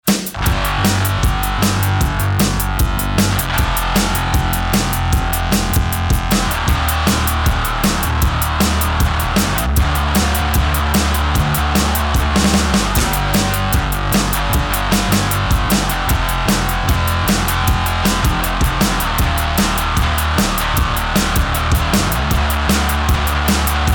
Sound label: mountain stab